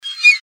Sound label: metallic squeak